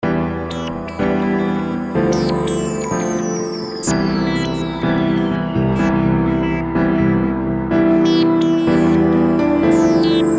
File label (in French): PIANO DE COEUR